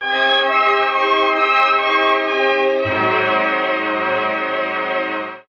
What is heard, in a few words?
strings the dramatic reveal; Loops; Sad